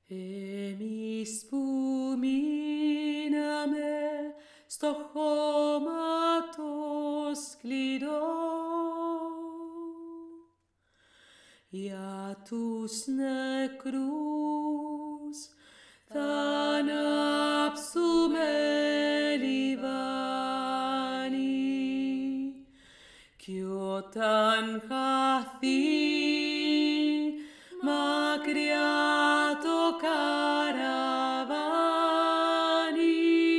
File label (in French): voix 2 principale; EMEIS POUR MEINAME